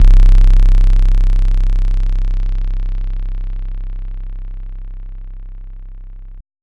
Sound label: Bass; Chum